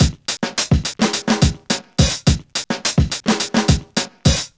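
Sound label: 106 bpm, Funky loops; Zero-G; Funky Elements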